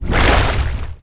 Summon Water; Nicktoons Nitro; arcade

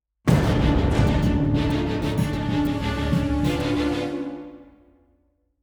190bpm; Legends of Pegasus; windows gamerip 2012

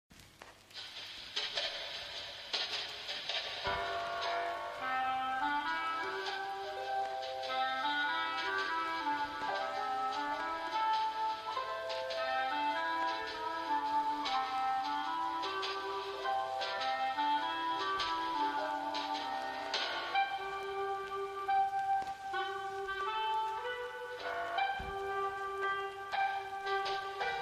Clarinet; bendy and the ink machine chapter 5 the last reel; Lonely Angel

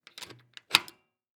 door unlock; a dump in the dark

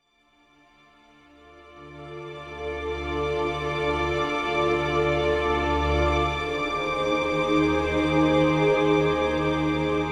Strings

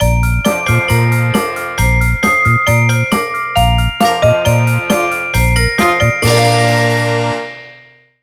Textbook Quiz Jingle

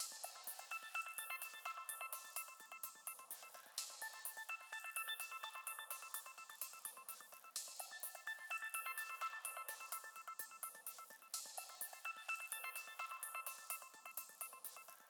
musique gameplay victory loop; gladiabots

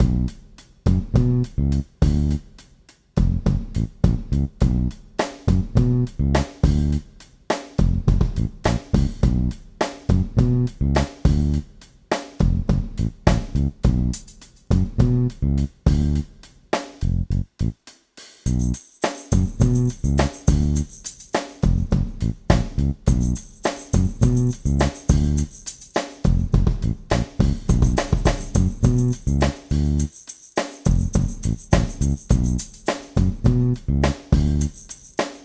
Beat; Peggle Beat 04

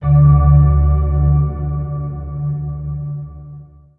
sting box close; monument valley

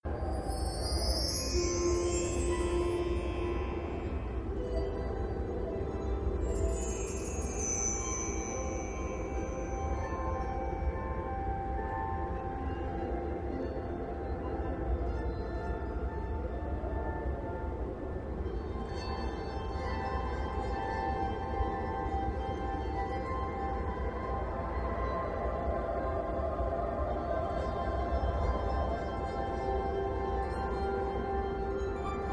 Pig Days (Ambient); Angry Birds 10th Anniversary Music Collection; Birds vs. Pigs Forever

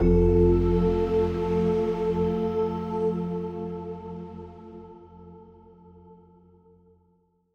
Stingers sting positive

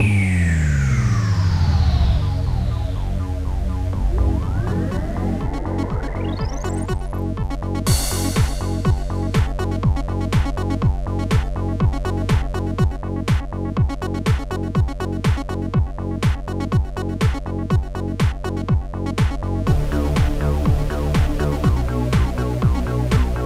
Techno; Operation Robodachi

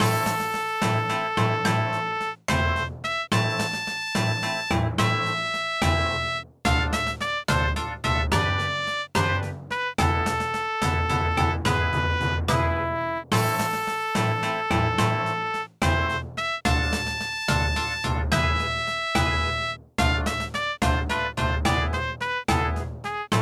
Lose (Fanfare); Mario Golf Toadstool Tour

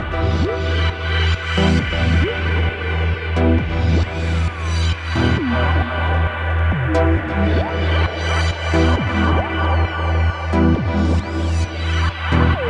Music Chase Menu; sayonara wild hearts 2019 gamerip

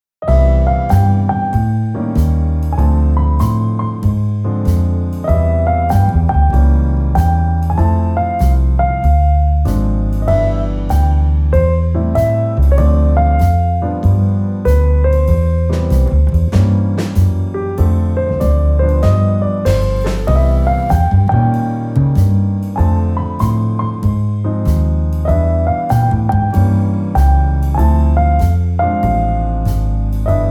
Jazz Trio; New Year

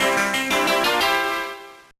Unlocking Reward; cars mater national championship gba gamerip 2007

